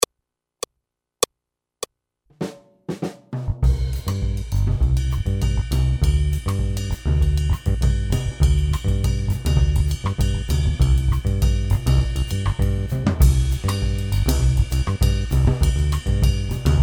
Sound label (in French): Accompagnement seul